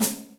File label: Dry room, Electric Snare, Yamaha DTXpress